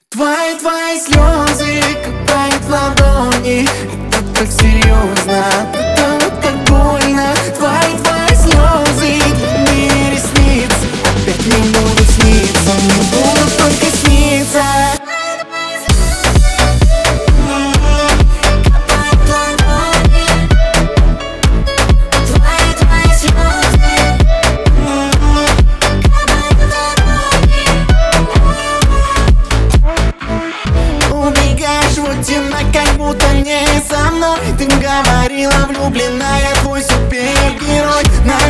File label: phonk